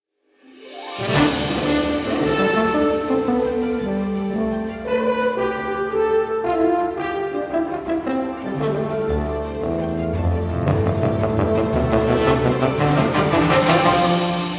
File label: Two Winds; FHorn+Tuba